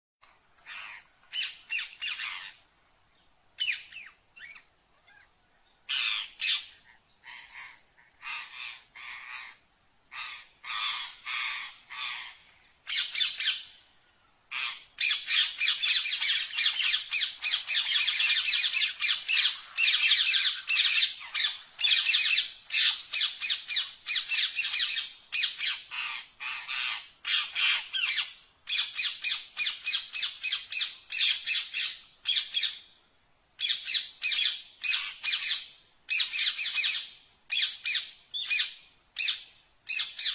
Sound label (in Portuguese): canto do ring neck cinza